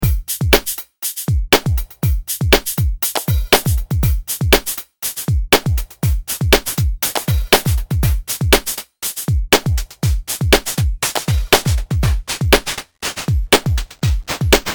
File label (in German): Drums einmal kaputt und zurück